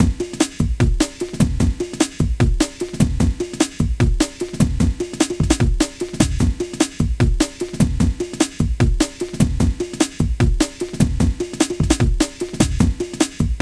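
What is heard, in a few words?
drum n bass; INIS; Funky Xtreams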